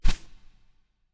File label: wing single; smite; khepri